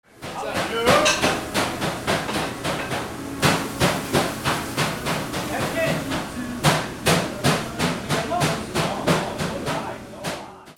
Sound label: AMBIENCE